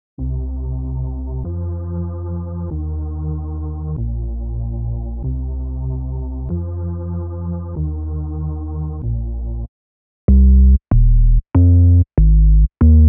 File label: 95 BPM D# Maj Bass; Melody Loops; Loop Stems; Intro; Hip Hop; Cymatics